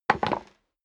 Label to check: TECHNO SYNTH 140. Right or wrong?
Wrong